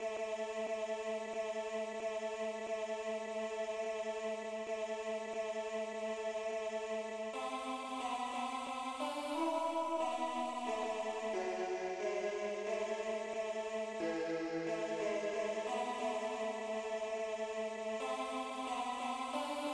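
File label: shir Hanoded tenor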